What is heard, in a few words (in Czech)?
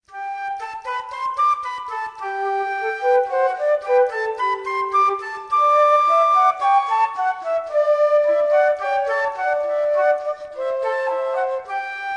Fuga, duo příčných fléten; Johann Pachelbel